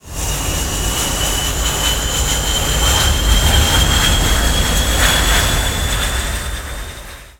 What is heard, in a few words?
dropship gain eng; Quake 4 Alpha; id software